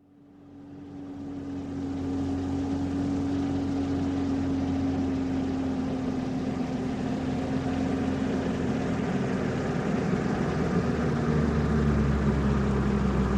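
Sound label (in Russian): UAZ car drives up and revs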